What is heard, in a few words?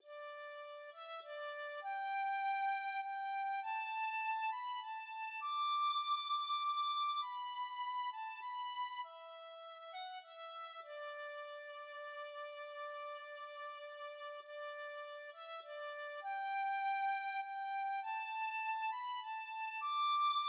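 penny whistle, tin whistle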